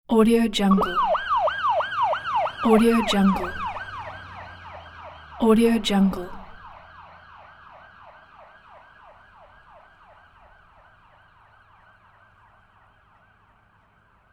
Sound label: police siren